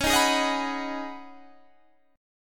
C sharp 7th Suspended 2nd Suspended 4th C sharp down Guitar Half Step Down